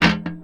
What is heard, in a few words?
Percussive Hit, contact mic; Percussive Hits; musicradar; contact mic madness